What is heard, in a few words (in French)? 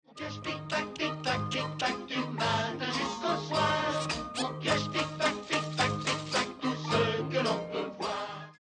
tic tac